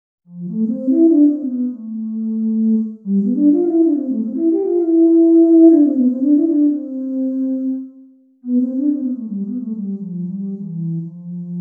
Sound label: flut; wallander instruments; wivi player